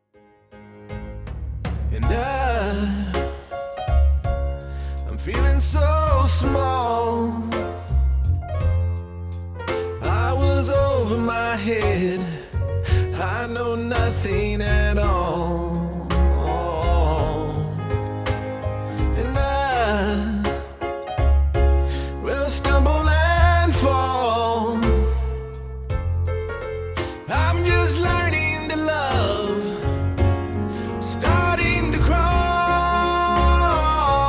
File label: Vox